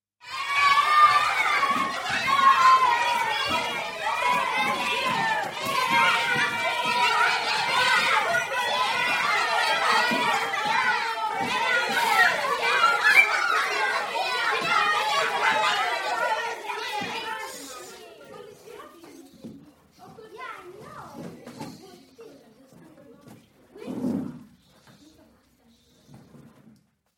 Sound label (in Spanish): ninos jugando en la escuela; efectos